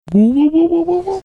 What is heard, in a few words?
making whoo whooing sounds